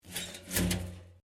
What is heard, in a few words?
Pipe Tin Move; Metal; Materials